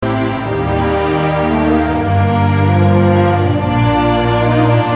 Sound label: Game Tune